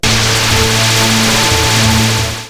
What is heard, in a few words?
beam bomb; tf; danmaku fortress; reimu